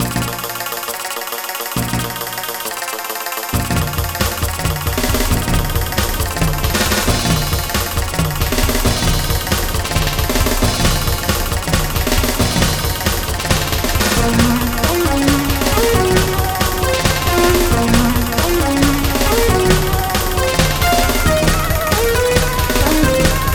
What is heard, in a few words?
TECHNO; battle